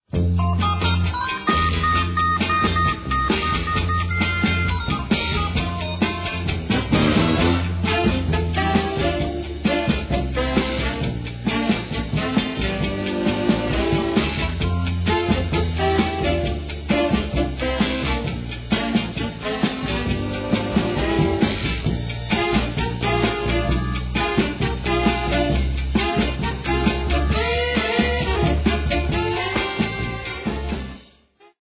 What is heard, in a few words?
Trumpet Discotheque; Any Wednesday